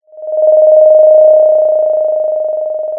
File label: Sound of a phone ringing